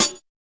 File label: fx drum, Electro FX; UNUSUAL KITS and FX